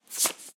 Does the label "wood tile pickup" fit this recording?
Yes